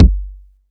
long steel kick drum sound g key

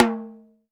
high floor tom one shot a key; tom drum

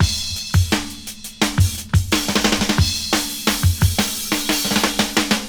87 bpm drum groove f key

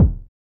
old school long bass drum E key; kick drum samples

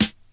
00s smooth rap snare g sharp key; snare drum samples

